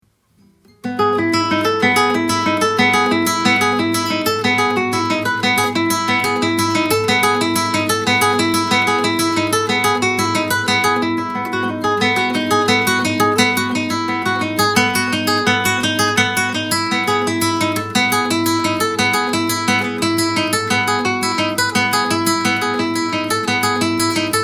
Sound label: guitar